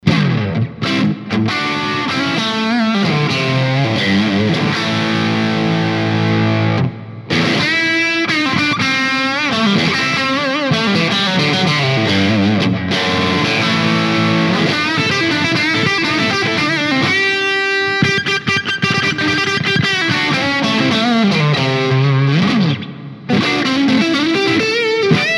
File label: jazzy